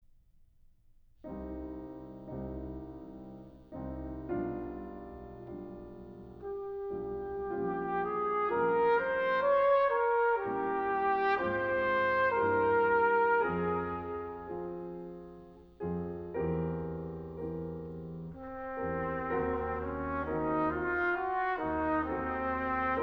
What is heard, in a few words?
Sonata for Cornet and Piano Andante molto espressione